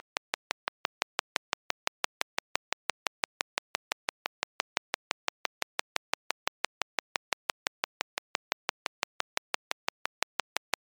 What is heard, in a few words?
clicks